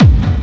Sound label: Bassdrums Hard Kicks; Vengeance Samples